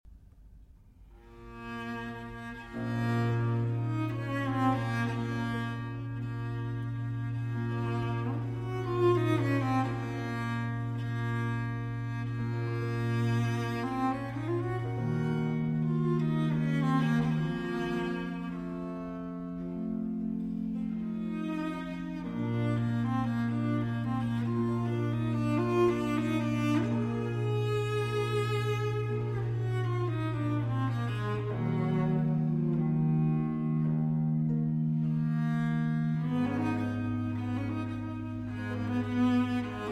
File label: Cello Concerto in B Minor, Largo; RV424; Vivaldi